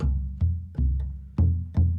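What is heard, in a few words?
Calm Far, music; From Dust; PS3; Xbox 360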